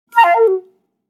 HTC 10 Sense 8 Sound Suite Stock Notification; Pastel

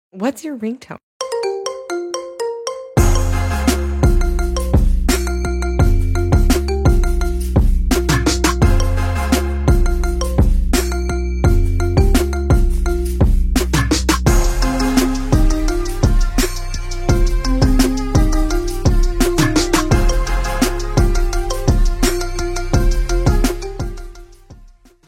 iPhone 15

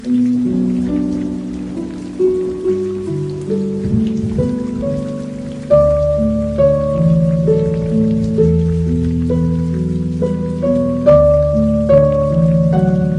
relaxing rain; Soundeffekte

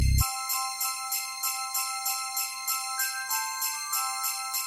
SMS Jingle Bells

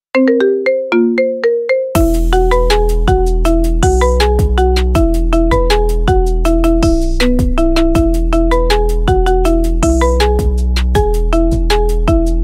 ice cream marimba remix

